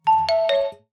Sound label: Reminder, notifications; Elephone U Pro